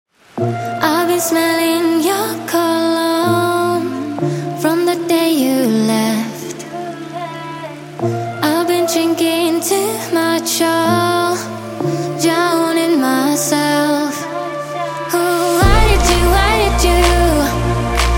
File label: Construction Kit Full Mix